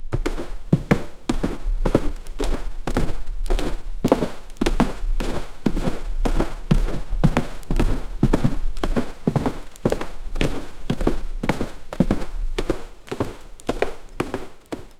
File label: running wood ground fas, footsounds